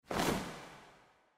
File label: Curtain Close; slots; Valentine Blast